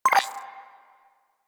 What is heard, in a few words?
UI Default Theme Squad Received; Warframe